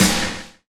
SNARE; E-Lab; X Static Goldmine 2

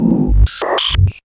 SYNHLD; E-Lab; X Static Goldmine 1

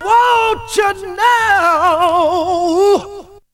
VOC; ZERO-G; Datafile

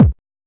HSE BD

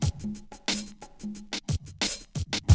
BREAK

